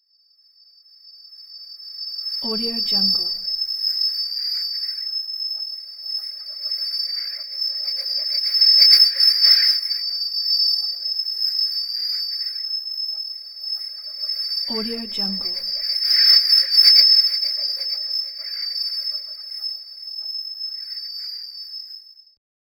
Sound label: abstract alien wind